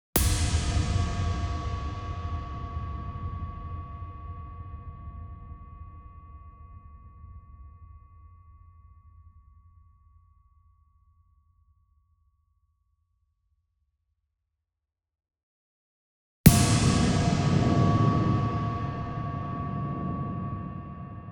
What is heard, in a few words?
SWEETENER Thunder Sheet Hit Near; boom library; Cinematic Strikes Construction Kit